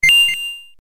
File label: se coin, 8bit